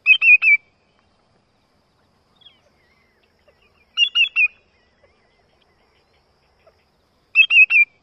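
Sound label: tu tu tu calls, greater yellowlegs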